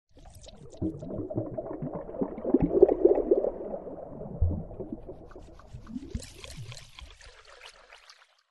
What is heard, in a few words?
water magic transition